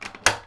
PICKLOCK SUCCESS

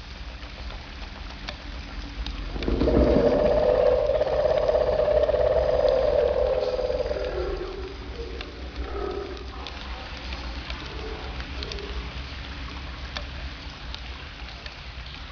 wood day

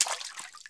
step water

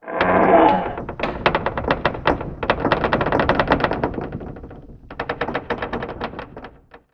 tree falling

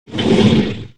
AIRBAG IN

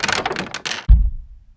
door close

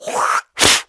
SPIT LONG

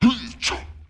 orc ambient short

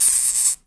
AMBIENT

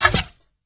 bow fire

